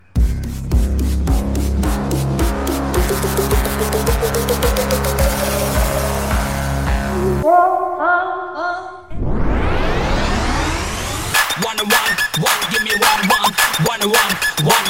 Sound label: Shocked BGM